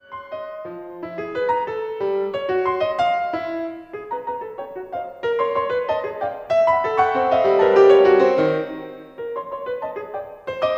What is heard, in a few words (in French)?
pour piano; RTBF